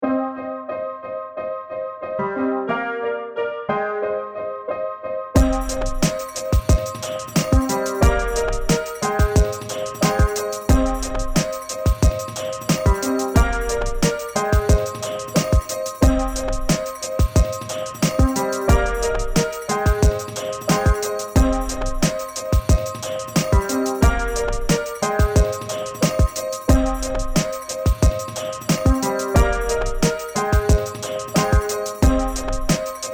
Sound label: Be Nice instrumental